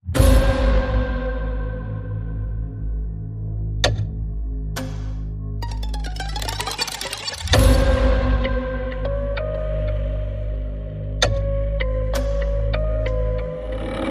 Itsy Bitsy Spider Horror Inst

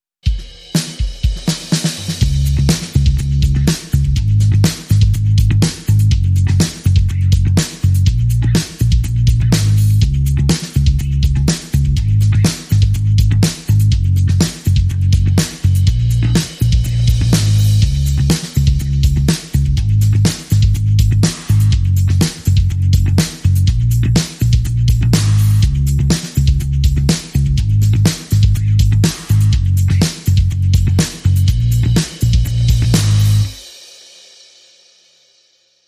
Positive Team Motivation Drum and Bass

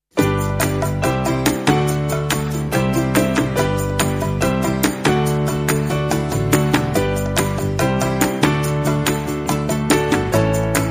Acoustic

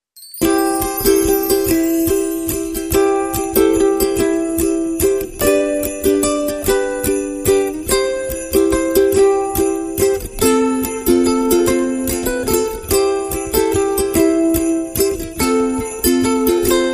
Ukulele Bells

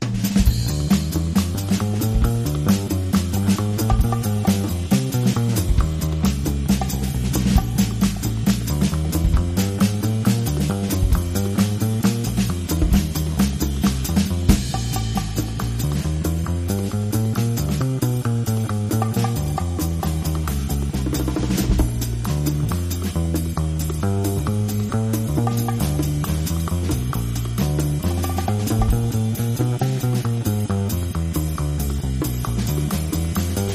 Mad Jazz Showbizz, Rhythm Underscore

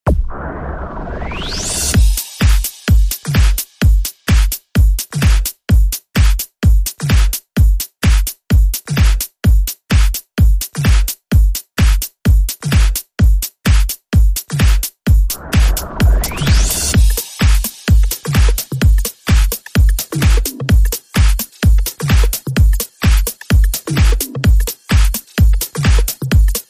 Beat

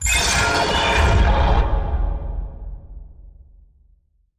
Big Echoey Sputter, Taylor Grand

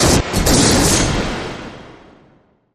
Broken Crashy Blast Impact

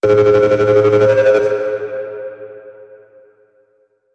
Alr Short Buzz

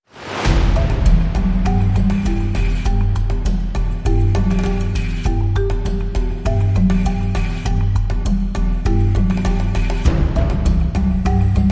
No Piano

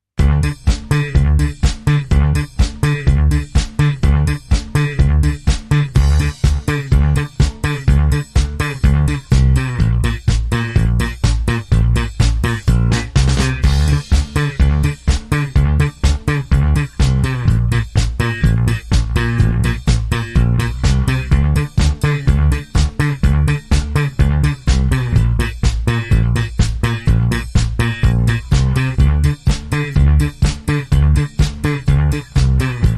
Dance Night Bass

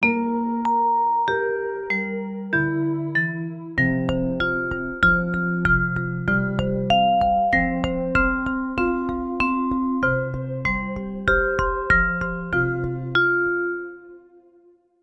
Sincere Marimba

